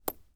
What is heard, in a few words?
Weapon Bullet Shell 3030 Drop On Sand; AdobeCC